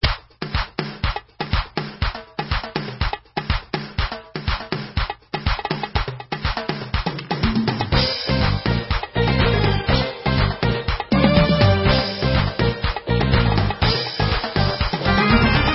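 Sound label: Instrumental, Israeli Dances; Zorna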